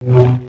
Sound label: saber spin; weapons